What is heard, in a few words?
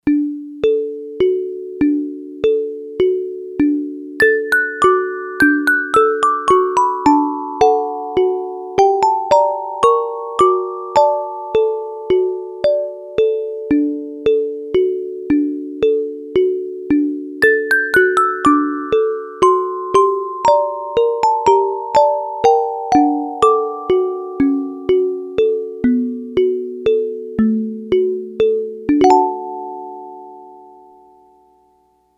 Celeste, Yamaha DX100; Factory Patches